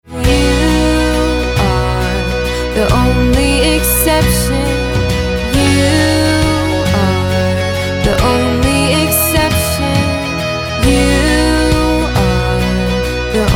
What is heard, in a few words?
Pop Sample